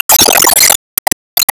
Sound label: Enemy Shellwizard Gimmick Disappear; disney star smash android ios mobile gamerip 2020